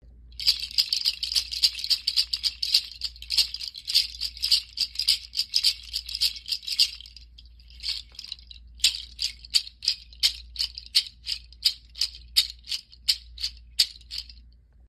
Kenari Seed Shaker, kenari seed rattle

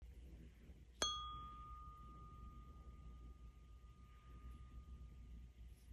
Solar Plexus Tuning Fork; tuning forks chakra color yellow for solar plexus

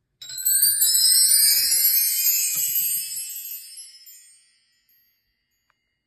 Frequency Chime Sound; frequency chimes 36 bar single row with stand; atma buti

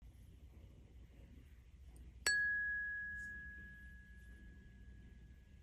Root Chakra Tuning Fork; tuning forks chakra color red for root

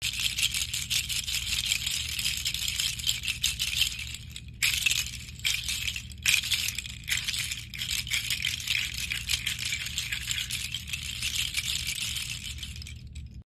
Bendo Seed Shaker

